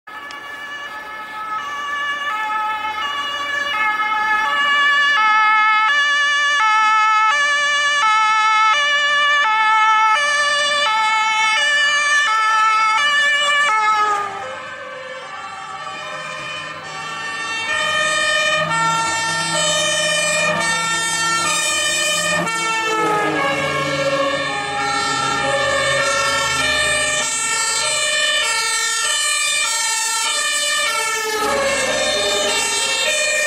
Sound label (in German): martinshorn fuhrpark, feuerwehr